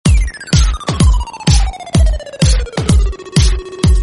free electronica message tone ringtone, sms ringtones; iphone sms ringtones